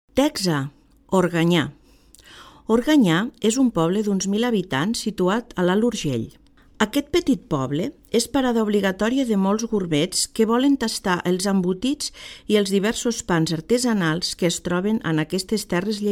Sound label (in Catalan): Organya; Model Elemental CIFALC; Àudios de l'àrea 1; CLUC (Certificat de Llengües de les Universitats Catalanes)